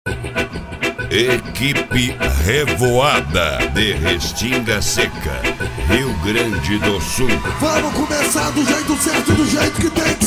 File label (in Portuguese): eletrofunk; cd equipe revoada especial fim de ano